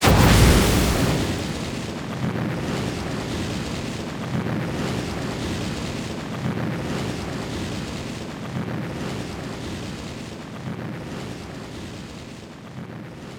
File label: medal get